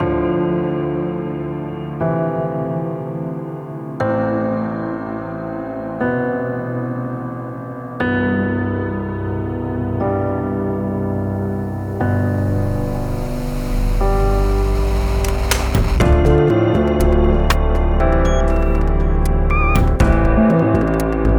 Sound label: accomplishment atmospheric piano